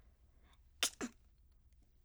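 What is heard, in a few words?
voice sneeze; kusyami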